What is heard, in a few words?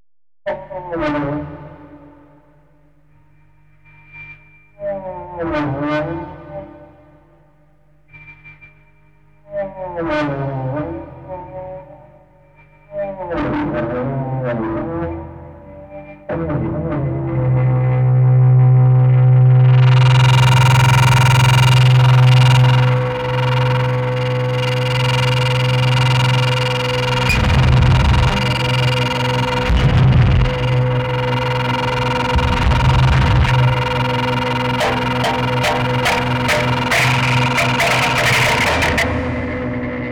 Japanese Expressions Of Guitar Solo; Japanese Expressions Of Guitar Solo 5